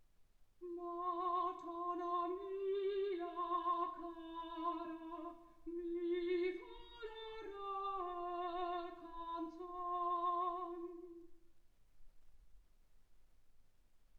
soprano

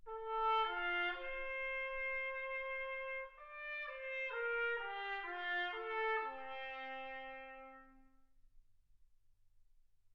trumpet

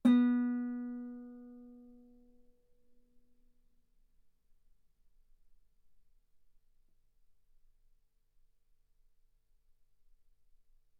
Guitar B3; ff; sul B

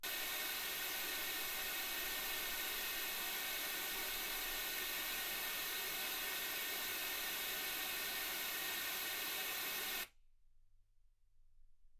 wgn

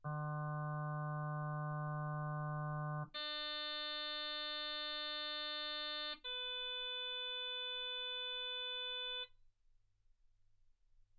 harm sinus 48kHz

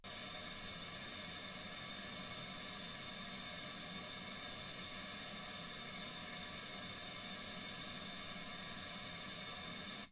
wgn

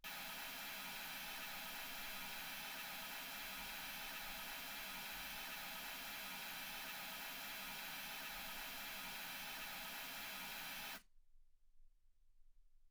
mls 48kHz

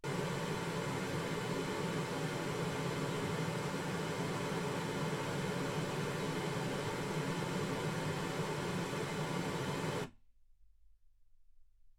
pink noise 48kHz

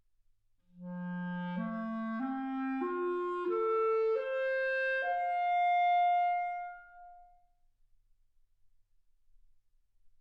clarinet

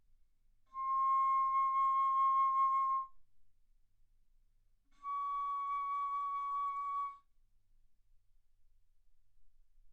Bass Flute ff C6 Db6

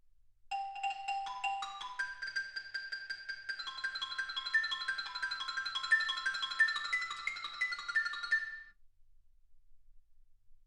xylophone

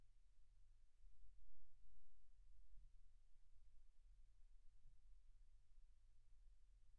silence 48kHz; single mic

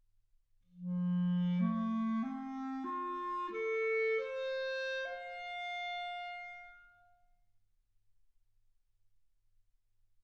clarinet

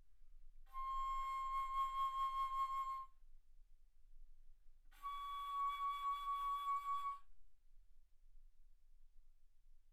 Bass Flute ff C6 Db6

